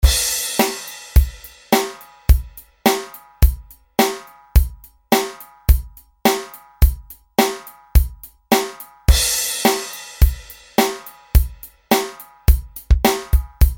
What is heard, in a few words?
DRUM KITS - Pop Kit; Apple GarageBand 2